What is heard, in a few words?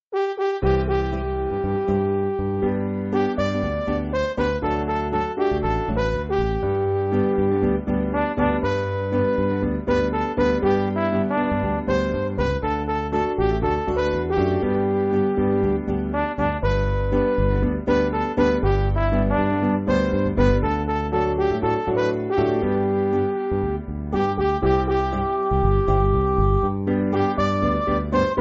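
Midi, Music Backing Files for Churches; 2/G Chord Sheet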